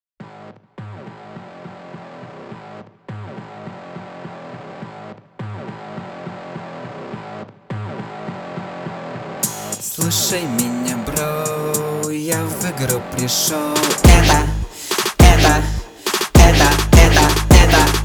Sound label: hip hop punk